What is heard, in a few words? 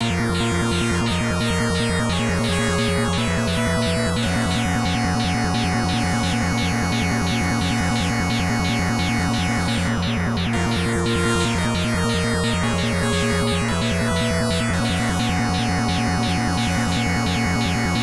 Boss BGM; Gemini Wing; X68000